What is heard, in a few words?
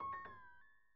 Piano Short Phrases; Piano Nudes